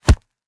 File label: hit; zig zag line